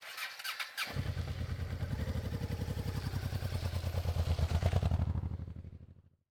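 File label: motorbike ignition & drive off; NS Studios - ultra sound collection; vehicles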